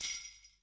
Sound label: gungru, African and Eastern Percussion